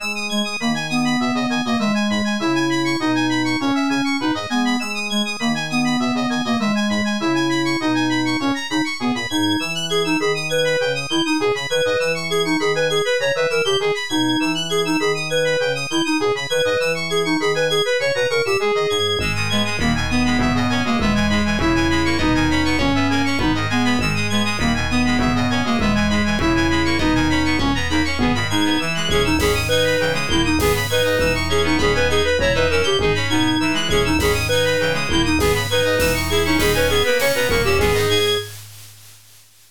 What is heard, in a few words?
OPL3; tetris classic